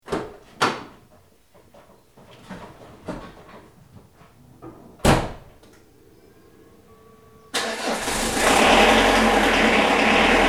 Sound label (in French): Ambiance démarrage diesel garage bruit de porte; bruitage